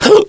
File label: Garlic Hit; Eft